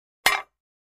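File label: Large Tile Square Drop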